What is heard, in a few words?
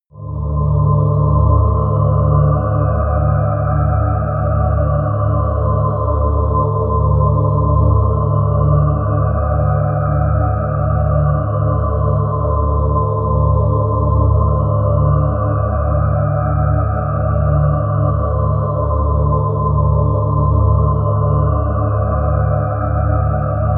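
dark place, pads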